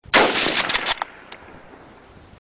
gunshot rifle

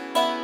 dulcimer odd note

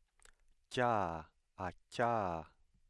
voiceless palatal stop

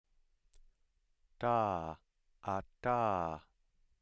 voiceless alveolar stop

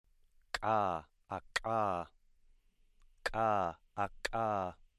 velar ejective stop

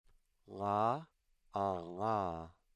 voiced uvular nasal

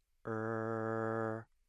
mid central unrounded rhotacized vowel

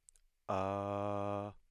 mid-low back unrounded vowel

